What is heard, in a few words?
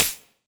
Tap Perfect; bansonic; BANSONIC SFX